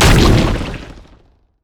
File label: elastic slingshot; LoL; zac base